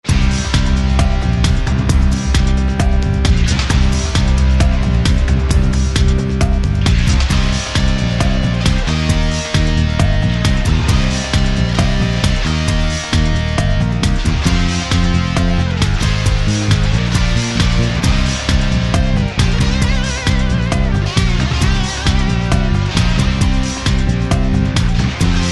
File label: Rock Open